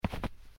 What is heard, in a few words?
step snow